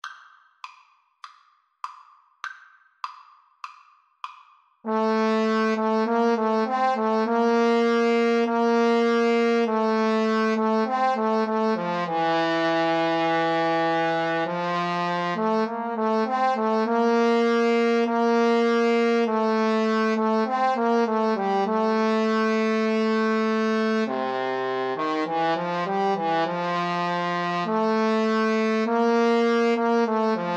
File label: Trumpet Trombone Duet